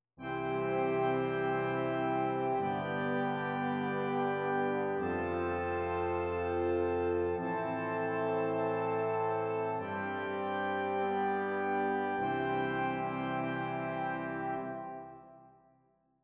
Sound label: Brass open harmony